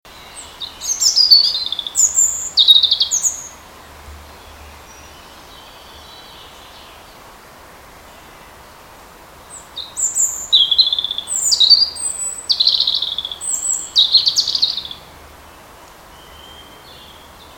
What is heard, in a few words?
erithacus rubecula; vogel; hecke; biologie